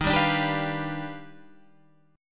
Eb Augmented Major 9th Eb down Guitar Half Step Down